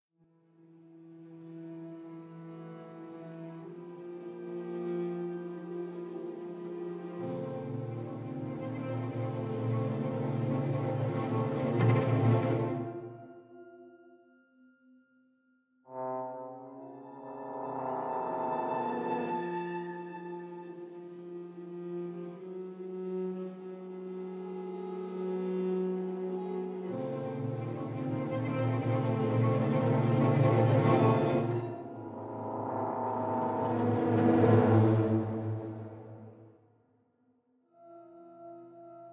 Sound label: Background Music